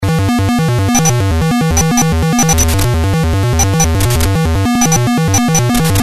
X1 Swarm

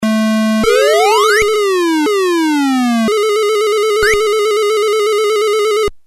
MSX; Trick Boy

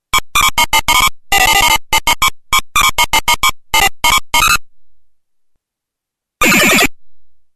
PC-8801; Fire House

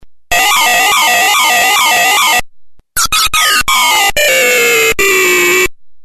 PC-8001; Asteroid Belt

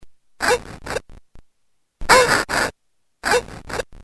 PC-8801; Emono Tachi

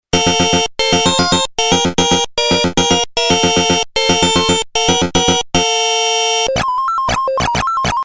Arcade; Xevious

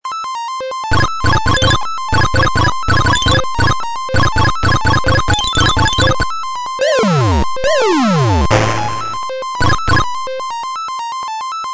MZ-2500; Xevious

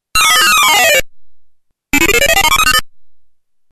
PC-8801

